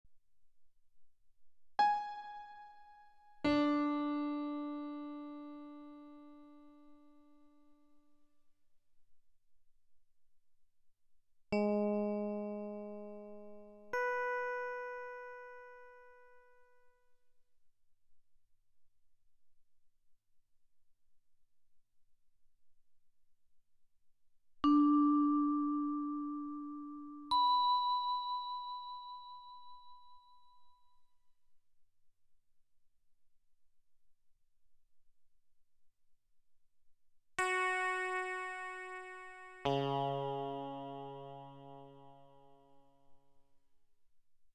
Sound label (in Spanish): intervalos abiertos